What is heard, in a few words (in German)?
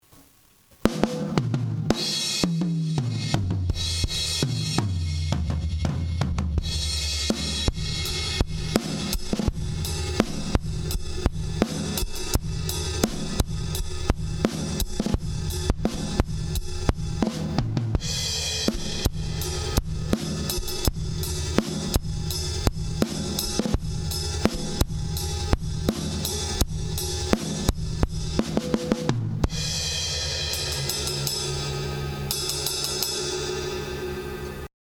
Kompressor; URS Strip Pro